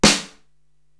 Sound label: SD Classic Room, Korg TR-Rack drum machine